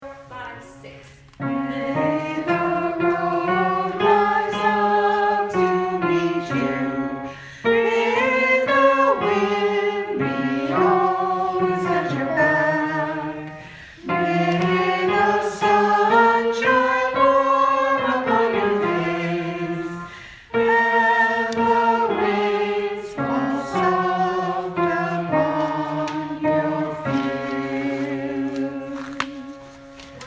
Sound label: Blessings All Voices